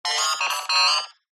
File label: science fiction robot android beep chirp classic